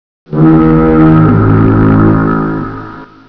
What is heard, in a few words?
boat